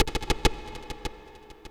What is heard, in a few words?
TOM INDST 140, Industrial, Drum